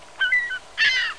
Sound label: Seagull sound effect short